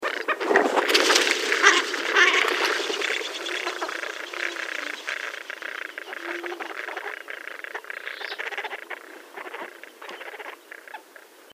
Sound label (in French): sarcelle cannelle, chant